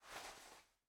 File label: Item Hand Move; MiSide